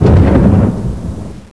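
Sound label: weapon empty; wpnmod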